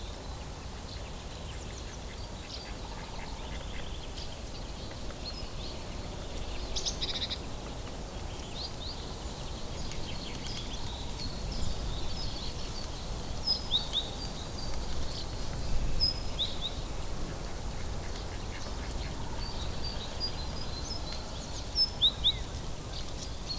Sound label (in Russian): veter na marshe